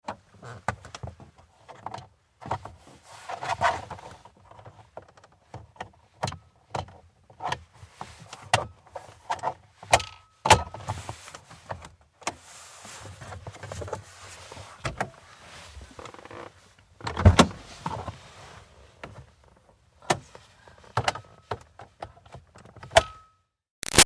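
Foley Interior Car Driver Searching Switches Moves; Cars; Vehicles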